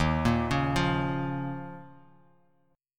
Eb 7th Suspended 2nd, Bass Standard, Eb7sus2 chord; 11,13,11,10; Eb7sus2 arpeggiated; JGuitar